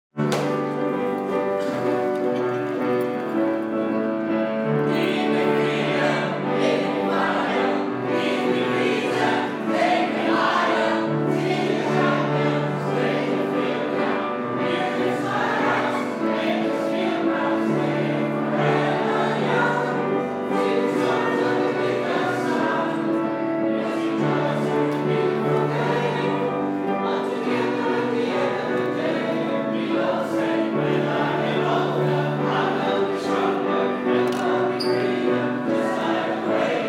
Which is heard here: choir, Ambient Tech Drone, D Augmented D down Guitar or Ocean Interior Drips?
choir